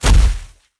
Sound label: jump; GrandFantasia